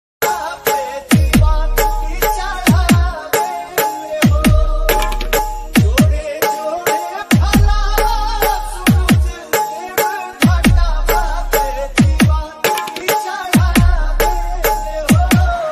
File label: chhath puja ringtone bhakti ringtone bhojpuri ringtone